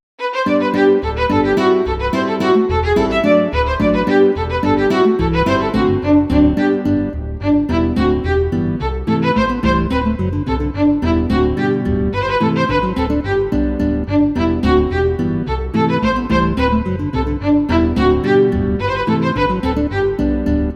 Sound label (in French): Bourree